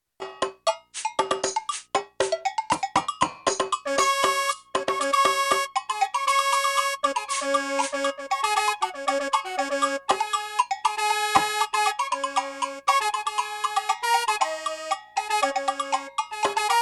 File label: African sound